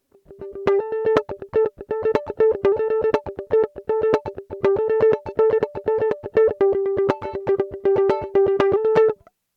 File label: mandolin comparison electric high single srml